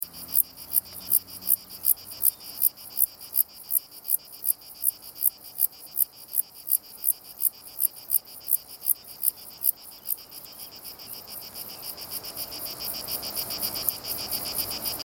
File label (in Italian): Zeuneriana marmorta canto